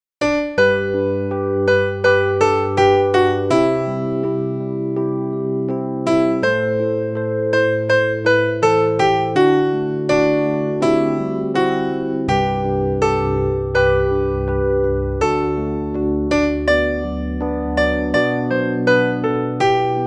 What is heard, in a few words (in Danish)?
sopran